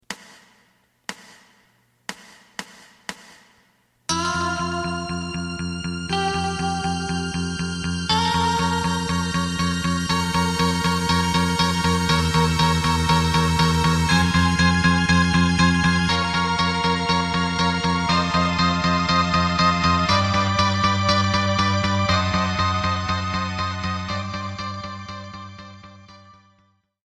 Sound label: drum